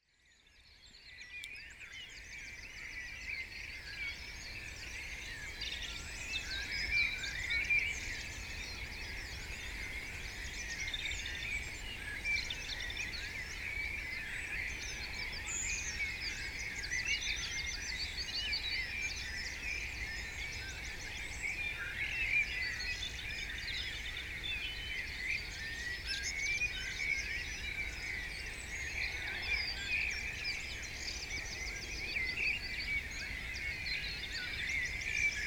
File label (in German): Vogelkonzert